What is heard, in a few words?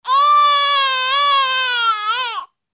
Baby Cry